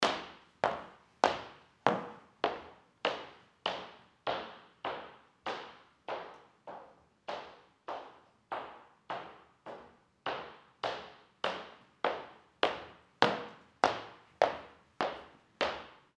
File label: steps on parquet floor